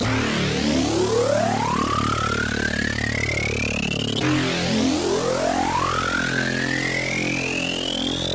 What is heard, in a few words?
D Bass Laser Xtreme; Zero-G; Future Wave